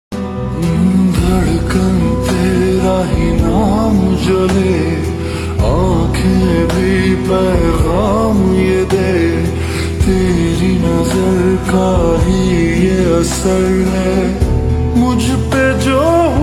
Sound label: Slowed Reverb; Yaariyan